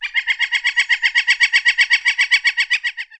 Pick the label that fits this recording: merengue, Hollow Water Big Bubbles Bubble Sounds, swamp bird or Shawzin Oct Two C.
swamp bird